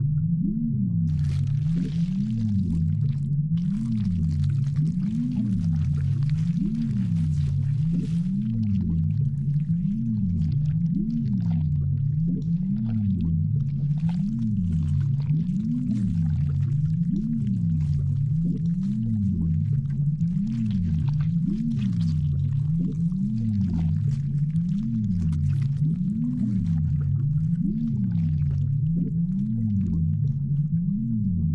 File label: Final Boss Corridor Ambiance